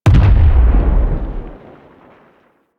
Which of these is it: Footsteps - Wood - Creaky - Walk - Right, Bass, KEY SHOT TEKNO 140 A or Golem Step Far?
Golem Step Far